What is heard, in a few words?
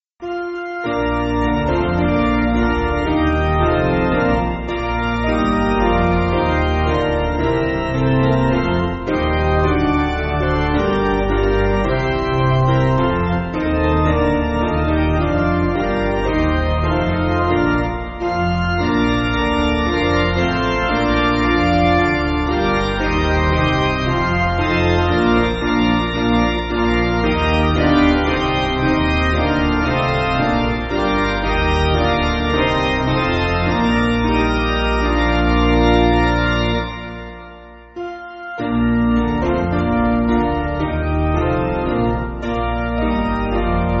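Piano, Music Backing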